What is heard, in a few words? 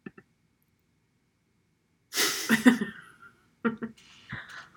Snare